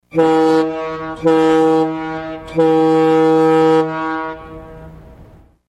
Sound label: boat horn